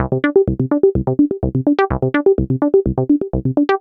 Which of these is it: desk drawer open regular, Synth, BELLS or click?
Synth